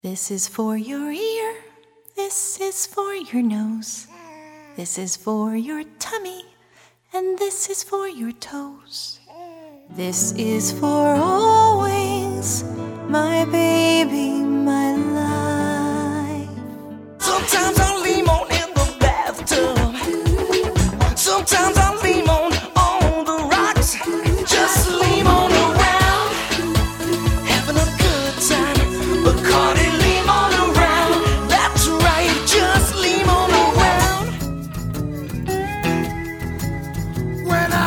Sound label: Vocal